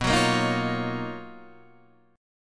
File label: B Major Sharp 11th, Guitar, DADGAD